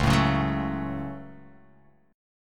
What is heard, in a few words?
Db Suspended 4th Sharp 5th; strummed; JGuitar